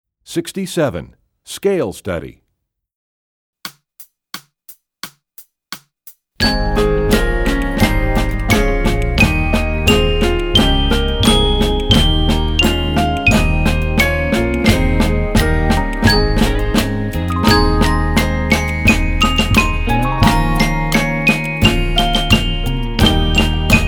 Scale Study, Percussion; EE2000 Book2

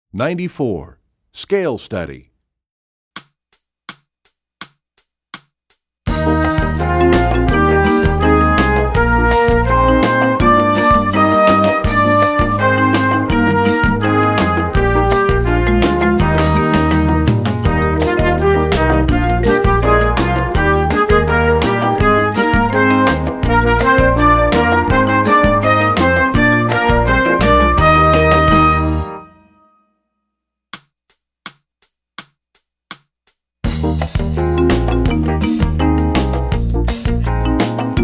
Scale Study; EE2000 Book2

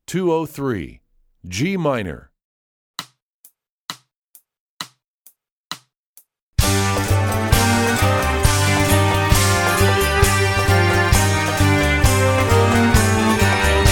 G Minor, Strings; EE2000 Book2

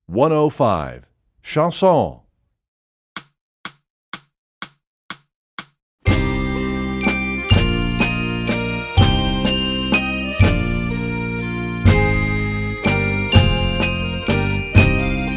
Chanson, Percussion; EE2000 Book2

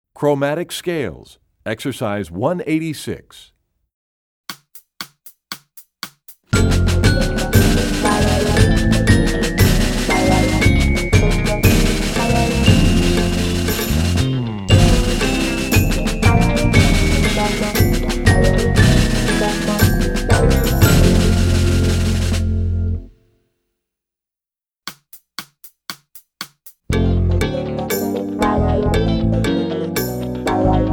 Chromatic Scales, Percussion; Rubank Studies; EE2000 Book2

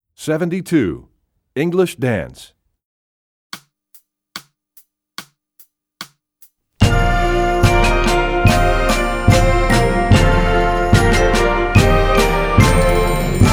English Dance, Percussion; EE2000 Book2

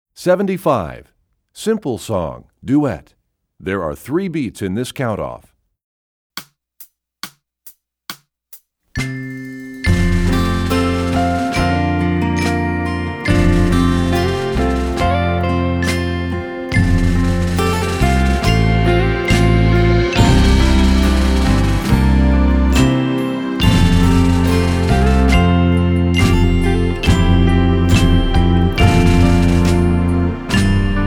Percussion; Simple Song; EE2000 Book2

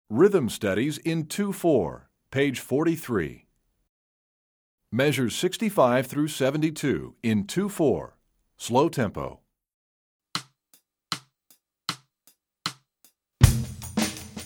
Slow Two Four, Percussion; EE2000 Book1

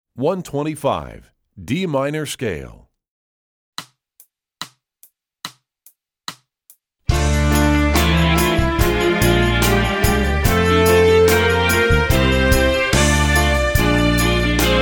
D Natural Minor Scale, Strings; EE2000 Book2

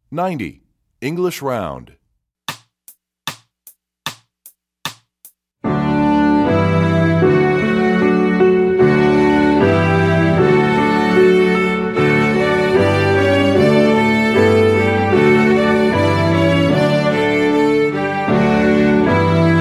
English Round, Strings; EE2000 Book1

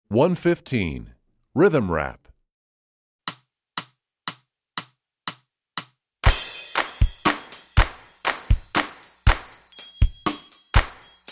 Rhythm Rap, Strings; EE2000 Book2

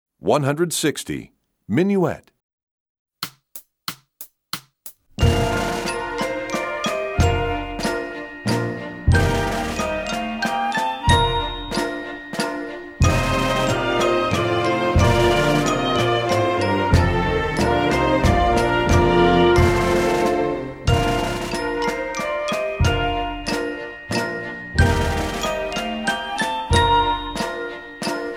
Minuet, Percussion; EE2000 Book1

